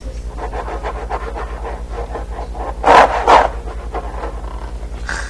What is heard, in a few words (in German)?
Geraeusch; Netstory